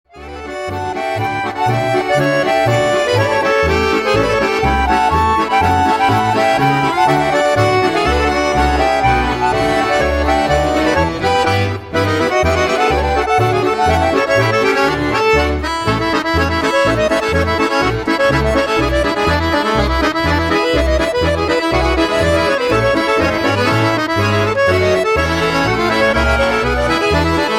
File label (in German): Neckertaler Schottisch